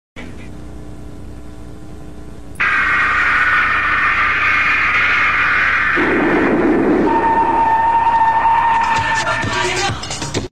punch

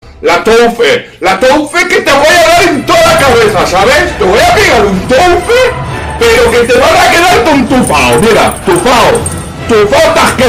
tense

